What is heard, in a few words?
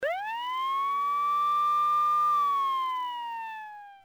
siren; Soldier Of Fortune II Double Helix; XBOX